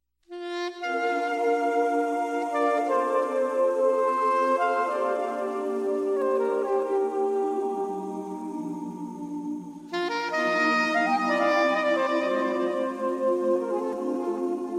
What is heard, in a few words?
short tone ringtone